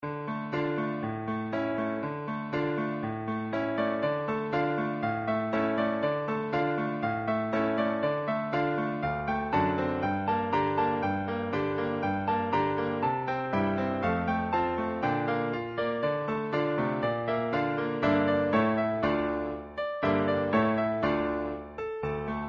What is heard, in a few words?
klezmer